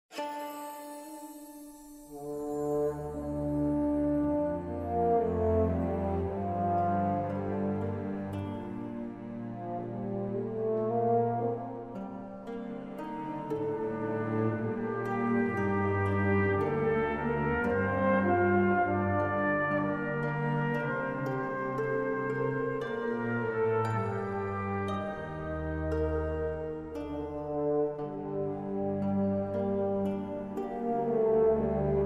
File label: Music